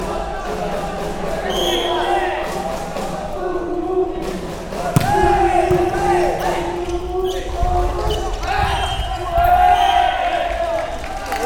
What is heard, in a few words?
mens vb